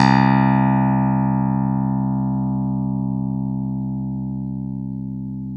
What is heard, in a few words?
Clav DA C3, Clav; Keyboards of The 60's and 70's